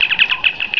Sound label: communicator; star trek tos